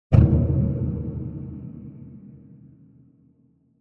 Impact cinematic bright attack hollow wooden thud with reverb and slight de; descending